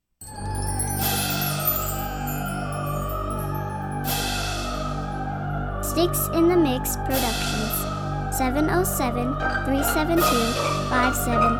classical

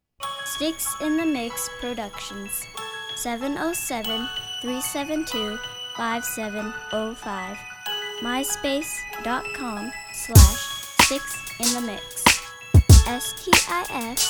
lyrical piano

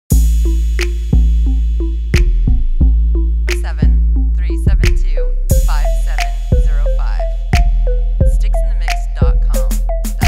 Club Snap

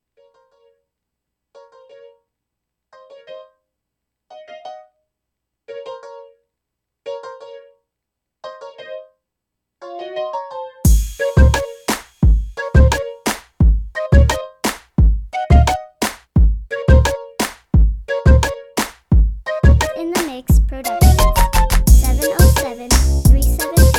flute beat

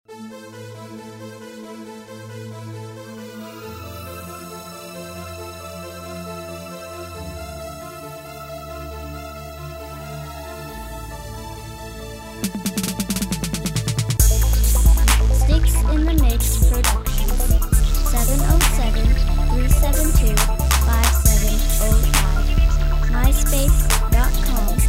florida funk

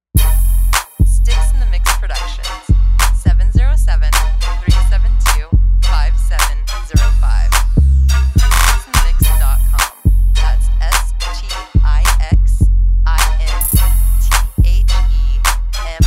Flutey Booty

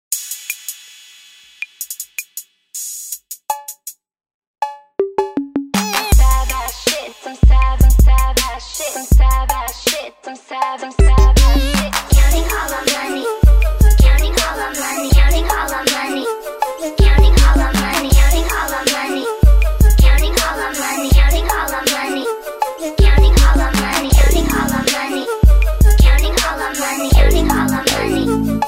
counting money

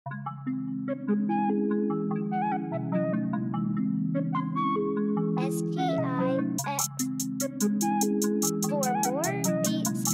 Dripping

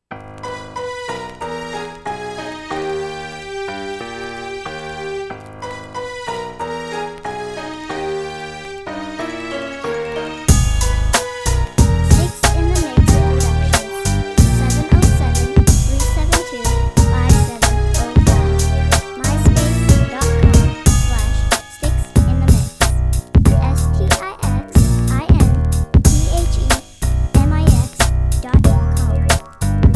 95 style

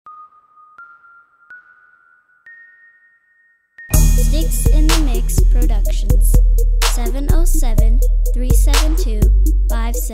west bass

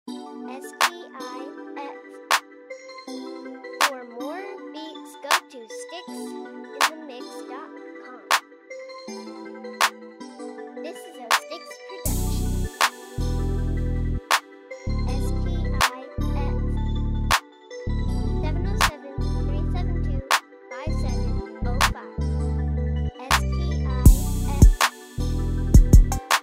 LoKeys

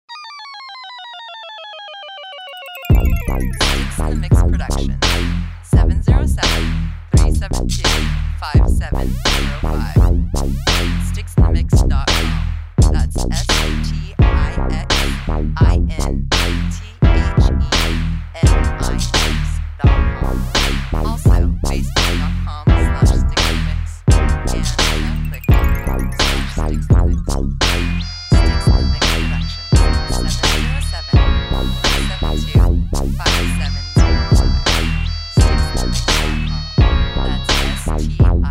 Stomping

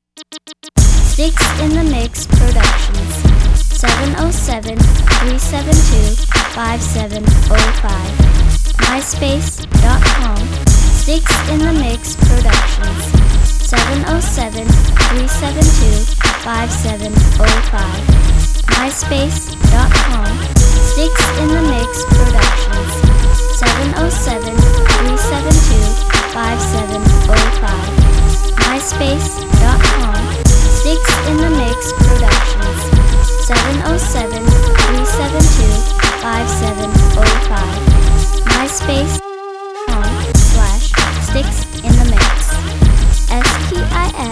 uptempo club

